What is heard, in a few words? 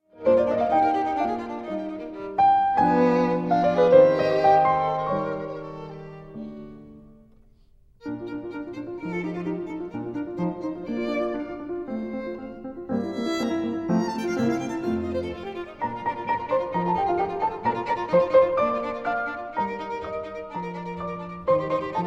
sonata for violin and piano; franz schubert; no 3 d 408 in g minor